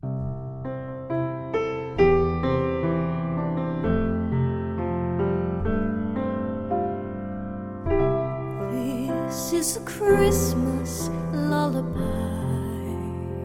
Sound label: christmas lullaby